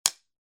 movie clap

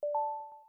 Smartcard Inserted

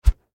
hover

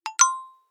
notification join

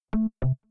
hangup

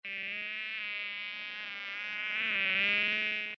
fly

